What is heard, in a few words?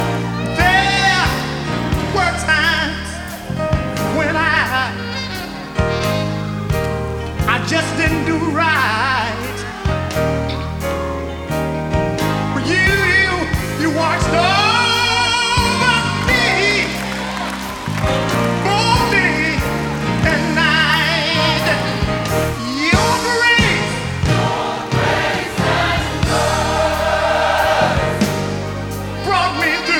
Choir